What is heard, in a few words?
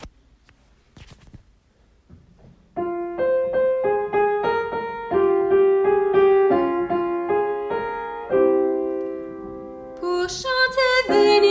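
soprano; canuts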